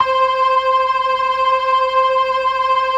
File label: LO MAN V R, ORGAN LOWER MANUAL